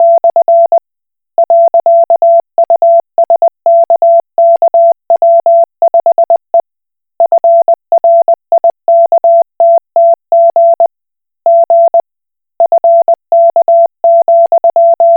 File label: Koch Morse